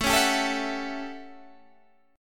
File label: A 13th, Guitar Standard, chord; strummed